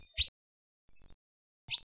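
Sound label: call, chaffinch, probably a mild alarm call. It is only uttered by perched birds. - SEX/AGE: adult male; Average time interval between calls : 9.4 s; fringilla coelebs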